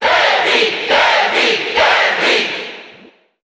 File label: Cheer Italian; Terry; SSBU